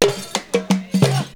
DANCE, ETHNIC JOURNEY, AFRICA; Best Service; Sound Cube

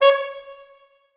C#4 L, Orchestral Brass

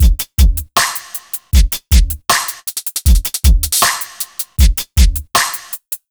BT; Ragga & Dub Reggae; USB Soundscan vol.27; AKAI

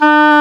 WND D OBOE, Oboe D'amore; Roland L-CDX-03 Disk 1; Oboe 4-8

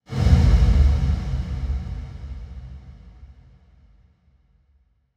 fx crush

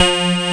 AN SYN, SYN & LEAD; SAMPLE WAVE MIXING 2

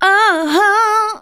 vox, Techno Trance; Ueberschall

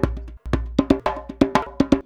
119 DJEMBE, Modern Percussion Loops

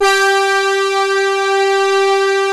SYNT, Analog Odyssey

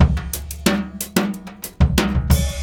DRUM 90

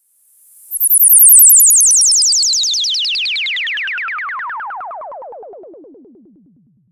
Breakdown FX, Underground UK House & Electro

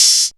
hat; Quasimidi Technox

